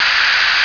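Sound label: STEAM LOOP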